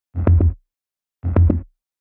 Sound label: 110 Fm, Melodic Loops; M8; Electronisounds